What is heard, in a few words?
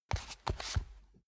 step barefoot wood parquet scuff; menschen; schritte